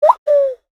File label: Owl SMS Cancao dos Passaros Sons de Animais; Samsung S20 Ultra